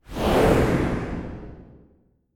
Woosh

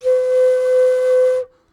Flute C

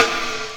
Kool Snare

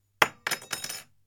Metal Piece Falling

